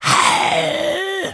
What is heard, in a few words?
zombie spawn; undying